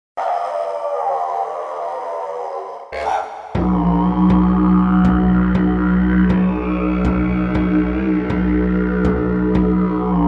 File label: drone key b